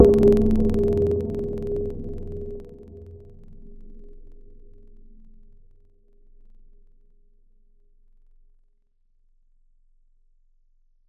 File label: Marimba; Synthetic's Serum Collection; Surge Sounds - The Sky - Serum Wavetables